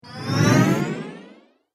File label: Space Jump Whoosh, whoosh sound effects; sound design